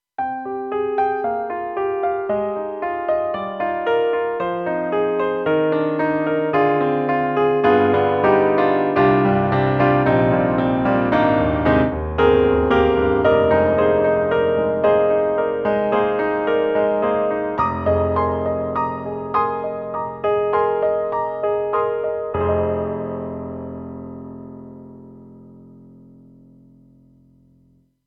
Classic Piano+Damper; KORG M3 XPanded Program

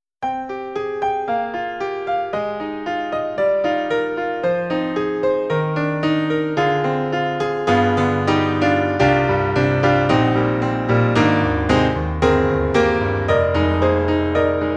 YAMAHA C7; Steinberg; The Grand SE 3; Lifetime Performance

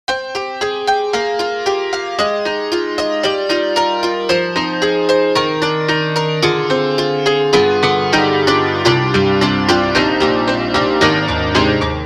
piano; EastWest

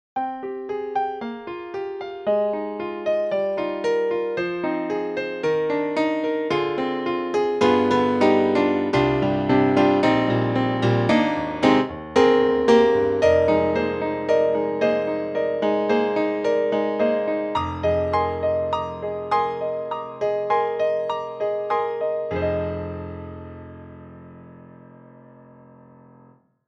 Concert Grand Grand with Overtones; Berlin; Native Instruments

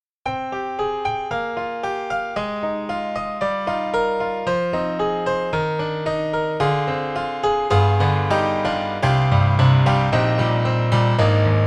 Mini Grand, Compressed Dance Piano; AIR Music Technology

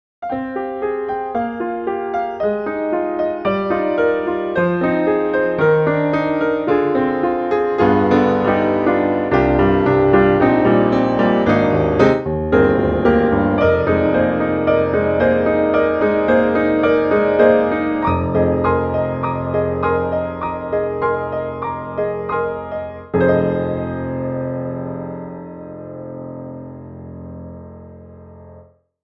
Concert Steinway Piano; SoundFont2; GPO

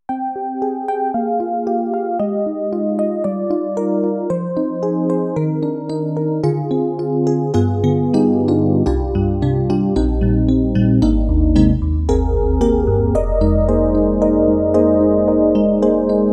EDIROL SD-90 FM E.Piano Soft FM EP; Contemporary

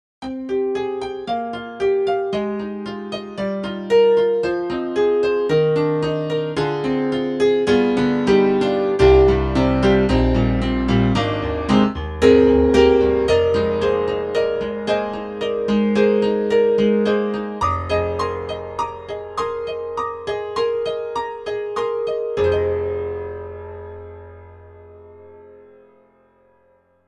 80s Piano Layer; Steinberg HALion One

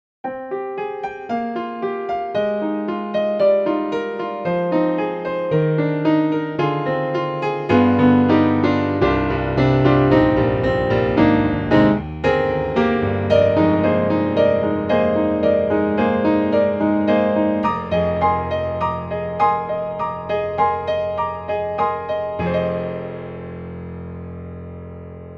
C7 Grand; Acousticsamples

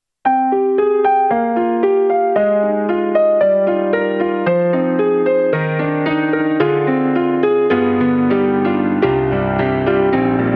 YAMAHA MOTIF-RACK ES, 78rpm Piano

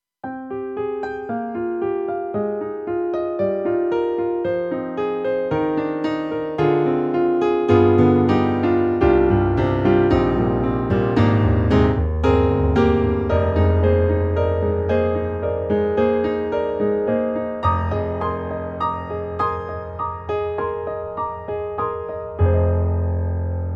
Piano and Natural Voices; KORG M3 XPanded Combination

